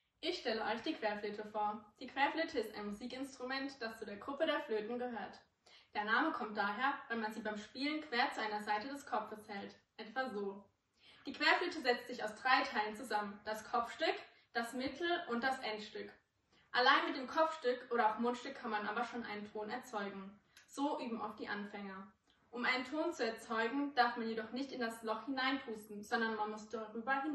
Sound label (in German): Querflöte